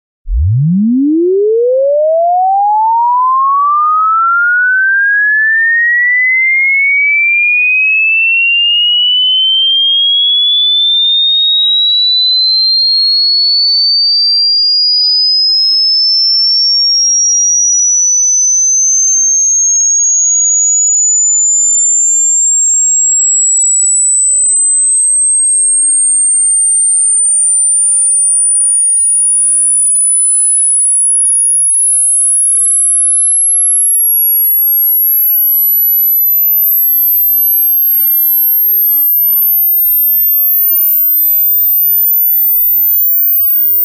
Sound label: sweep 20-20k